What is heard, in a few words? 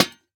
Lantern break; minecraft